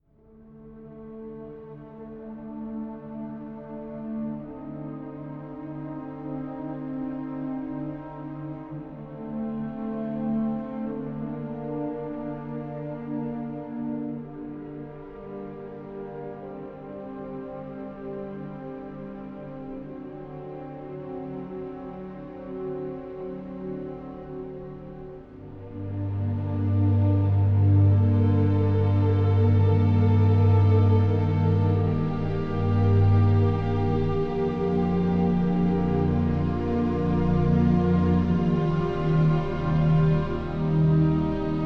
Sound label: Orchestral Train